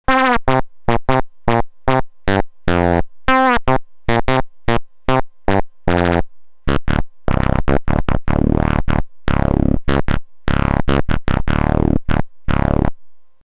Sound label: HS303 pulse low